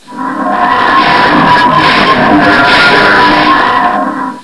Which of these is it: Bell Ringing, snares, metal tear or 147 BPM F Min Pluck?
metal tear